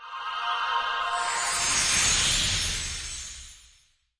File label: Transition